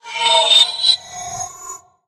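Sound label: sphere socket lock switch; Source Engine; Portal 2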